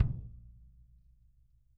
KICK, Drum Kits; Sonic Reality; Interactive Drum Kits; 90s sample cds

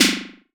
Snare Delay, TR-606 Bent Samples; The Electronic Music Manuscript Collection; Battery 3 Sample Kits

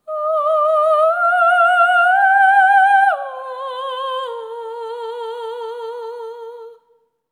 ETHEREAL, Symphony of Voices; Spectrasonics

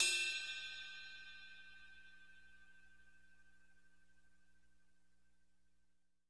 CRASH BELL

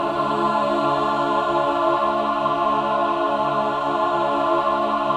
MIN CHORD, Symphony of Voices; Spectrasonics

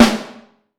Snare Drum; Q Up Arts; Johnny.C's Vintage Snare Drum; 5X14

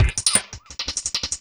Harsh, Funk, Beats; Sonic Foundry (Sony Creative Software); Synchro Funk

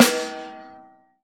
SD2 -R, Drums Acoustic; AKAI; 90s sample CD; USB Soundscan vol.10; RAW KIT 4

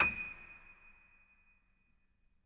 Acoustic Piano

